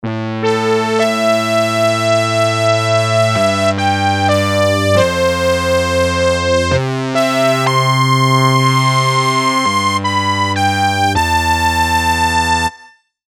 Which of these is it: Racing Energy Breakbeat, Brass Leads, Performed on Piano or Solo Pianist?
Brass Leads